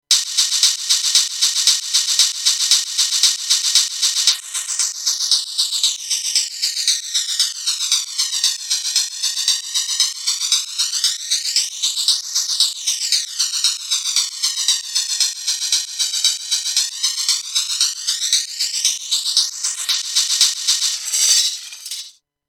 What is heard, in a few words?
WMD Chimera FX Comb Filter